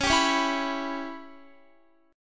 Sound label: Db Minor 7th Flat 5th, Guitar; strummed; 4E,4A,5D,5G,5C,6F; Dbm7b5